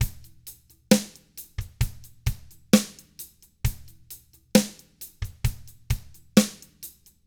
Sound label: SLOW ROCK, Drums; 66SLOWROCK